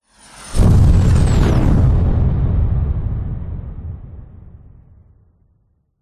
vehicles plane fighter jet burst into flames passing